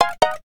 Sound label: resume